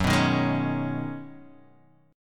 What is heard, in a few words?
C sharp 7th Suspended 2nd Suspended 4th F sharp